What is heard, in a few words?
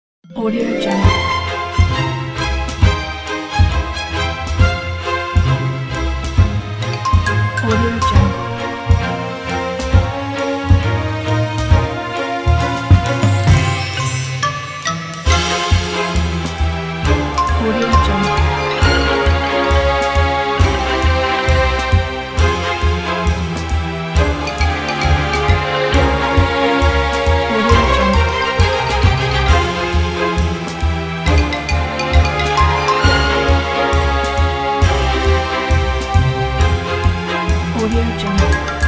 christmas adventure